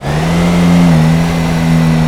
simulated vehicles revdown

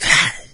melee, maggot; doom3

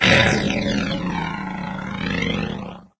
death, doom3 pinky

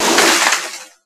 aluminium shake

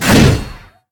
swing thrust

